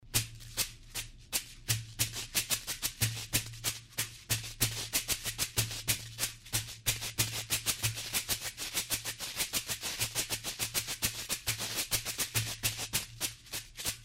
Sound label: afuche; lateinamerika